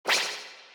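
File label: trident attack jump; En trident atk jump; Fight Knight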